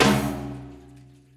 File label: spingle Drum End; wild bazaar